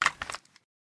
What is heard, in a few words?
deploy, weapons; dao